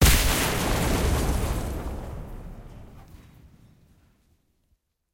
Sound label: sunk, battleship